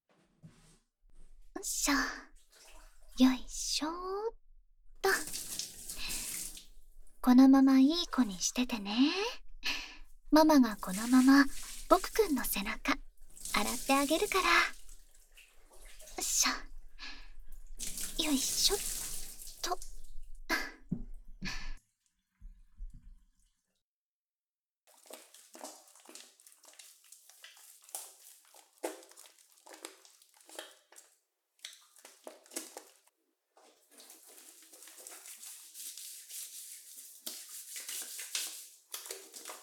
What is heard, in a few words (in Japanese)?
お風呂でママのあわあわあまあま背中流し（バイノーラルマイク 効果音あり）